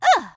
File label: "uh"; pauline; SM64 CO-OP